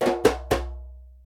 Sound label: Conga Licks